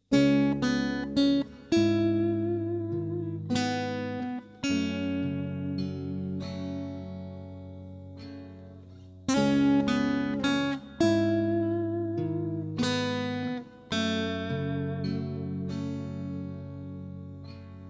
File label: Acoustic